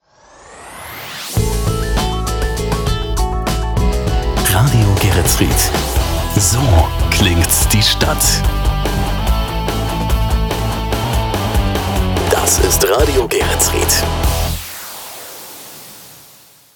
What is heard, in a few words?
Jingles Slow to Fast; Radio Geretsried